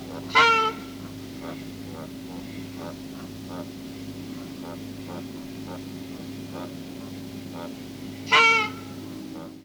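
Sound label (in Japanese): kawa u, カワウ 鳴き声; インドクジャク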